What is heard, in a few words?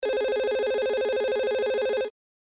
voice ringing